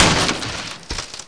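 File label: FENCE HIT; PC Gamer 4.2; mtm2demo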